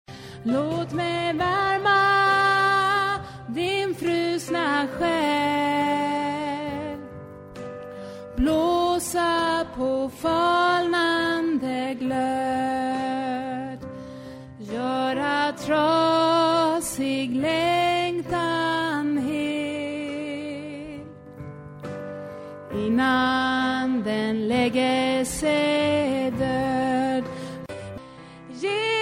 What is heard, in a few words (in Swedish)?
sopran